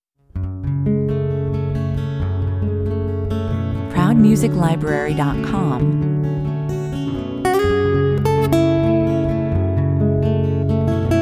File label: falling leaves